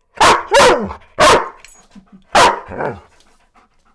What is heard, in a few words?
alsation x siberian bark